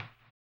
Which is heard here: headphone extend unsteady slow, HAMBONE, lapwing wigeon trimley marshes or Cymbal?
HAMBONE